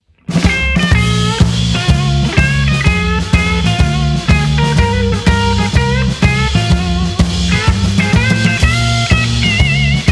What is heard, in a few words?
Happy Birthday Blues